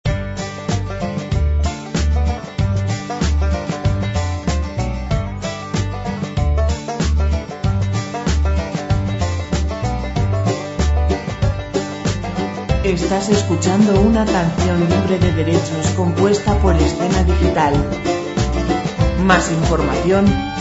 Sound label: country royalty free music